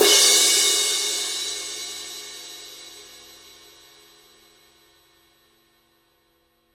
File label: Paiste 2002 20 medium Crash edge